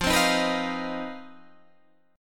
C Minor Major 7th Double Flat 5th F, chord

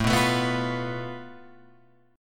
A Suspended 2nd Sharp 5th, Guitar, Open F; strummed; JGuitar

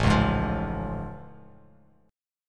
A Augmented Major 7th A Bass Standard; strummed; JGuitar

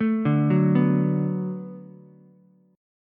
D 6th D down Ukulele, chord; D6 arpeggiated; JGuitar

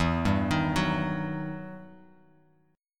E Minor Major 9th E down Guitar Open F; arpeggiated; JGuitar

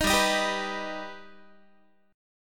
G Augmented, Guitar; strummed; 18,15,18,17,15,x; 4A,4E,5A,5D,5Gsharp,6Csharp

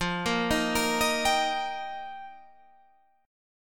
F 9th Suspended 4th F, chord; arpeggiated

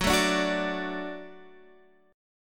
Gb Minor Sharp 5th, Guitar, Half Step Down

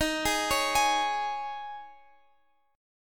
G sharp Suspended 4th; x,x,13,13,14,16

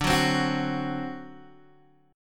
D 7th, Guitar, Open D

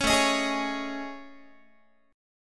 A Major Sharp 11th Guitar; AM#11 strummed; 16,17,18,18,17,x; 4A,4E,5A,5D,5G#,6C#

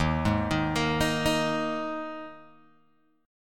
Eb Suspended 4th Eb Guitar Open G

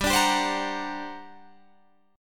G Minor Major 11th, G, Guitar, Drop D

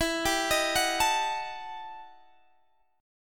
E Minor Major 11th, Guitar Half Step Down

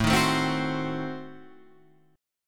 A Diminished 7th A, chord shape; Adim7 Chord {5 6 7 5 7 8} strummed

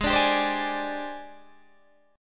G sharp Major 7th Flat 5th Guitar Open D; 18,17,17,18,17,18

